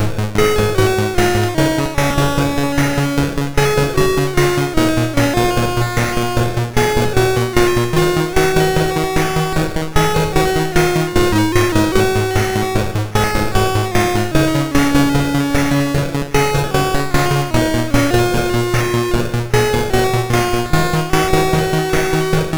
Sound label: Commodore 64 Music, MOS6581R2